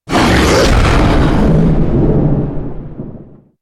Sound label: Thunder Clap; Hellbear Smasher